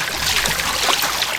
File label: Loop Water; KriptoFX; Realistic Effects Pack v1; PARTICLES